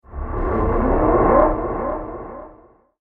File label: Timewarp, spacecraft; science fiction sound effect; star wars / trek style games; Andrew Fleming